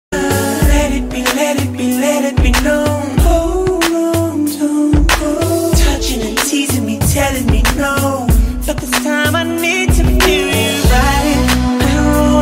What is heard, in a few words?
Song Ringtone